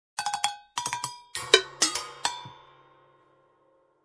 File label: Randomly playing steel instruments